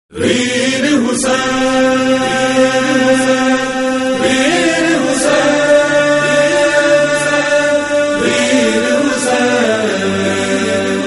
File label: Punjabi Noha; Muharram Nohay 1444